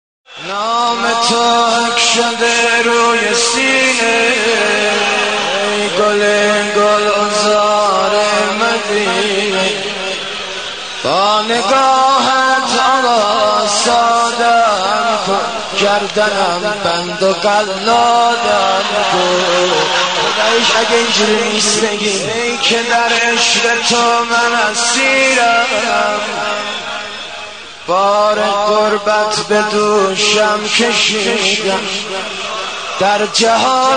مداحی

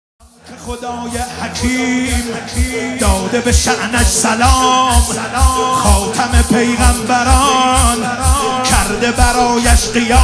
مداحی; شور

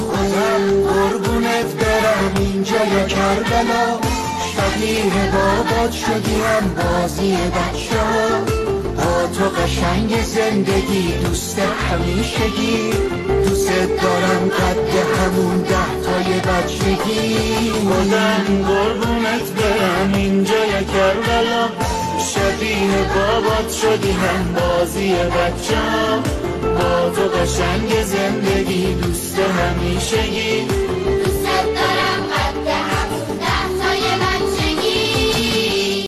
مداحی استودیویی

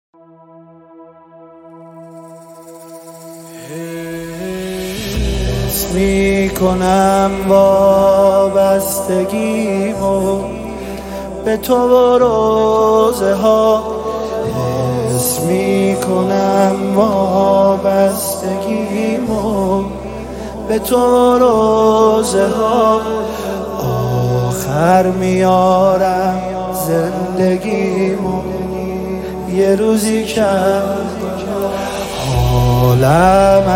نوحه

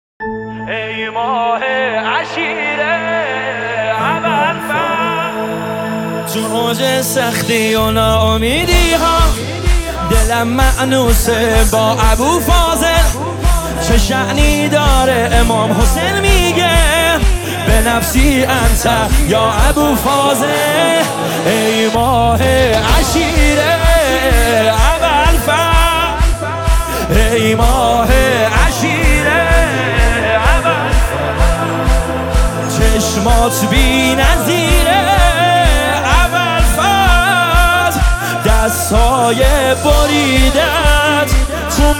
مداحی استودیویی

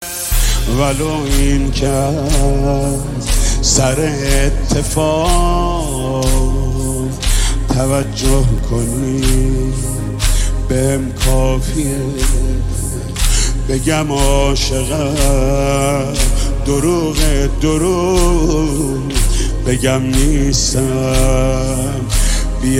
مداحی

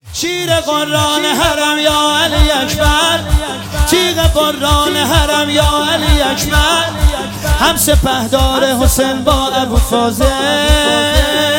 مداحی

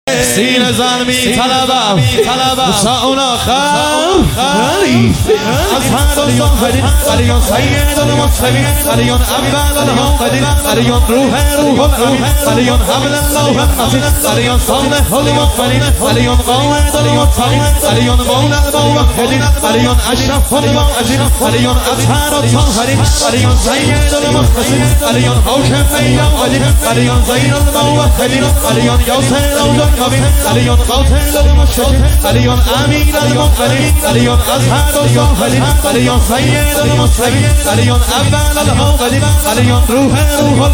مداحی

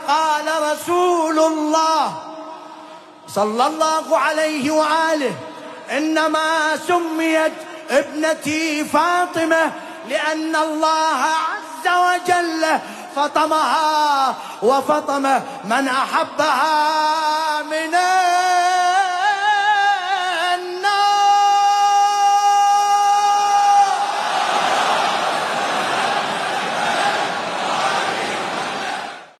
مداحی; مدح حضرت فاطمه زهرا س; Fateme

